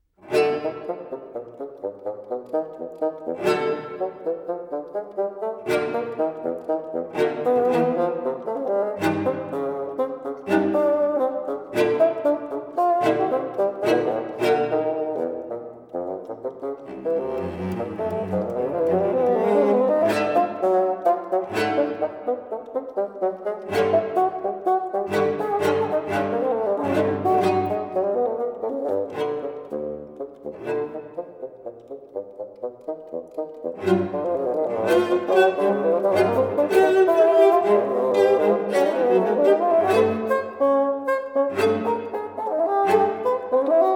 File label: Bassoon and Cello Con brio; Diaphonic Suite No. 2 for Bassoon and Cello III. Con brio; crawford seeger; chamber works